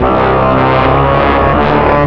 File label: Horn Sect Effect; Effected; Sonic Foundry (Sony Creative Software); Jazz Solos and Sections